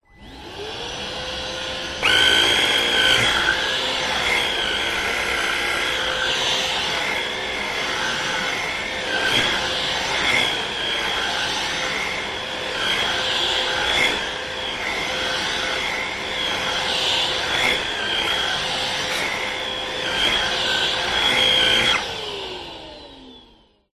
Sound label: household vacuum cleaner operate stick blade brush end; vax; zapsplat